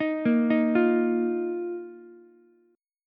Eb Suspended 2nd Eb down Ukulele; Ebsus2 arpeggiated; JGuitar

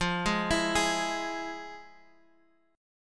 F Minor Major 7th, F, Guitar, Half Step Down

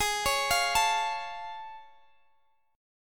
A Augmented Major 7th Ab

